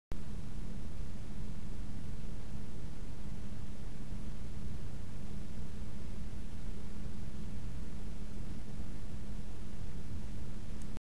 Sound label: Aerocool Shark Evil 7V